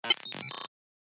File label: sms iringtone glitch